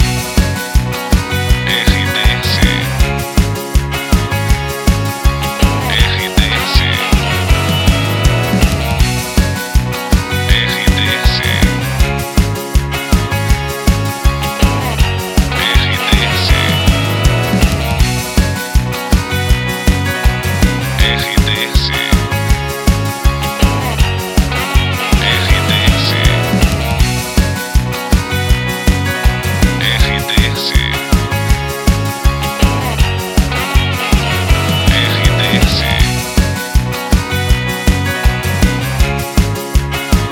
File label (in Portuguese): TRILHA PARA LOCUCAO VIDA SERTANEJA